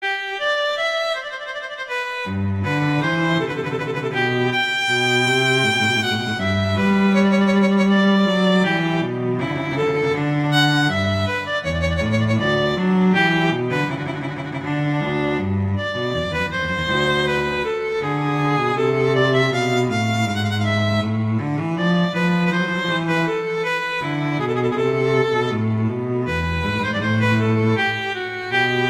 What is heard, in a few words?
Larghetto; Telemann; Canonic; Sonata 2